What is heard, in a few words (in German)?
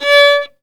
GEIGE; Best Service; Alpine Volksmusik